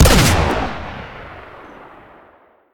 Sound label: shotgun fire; weapons; kuilu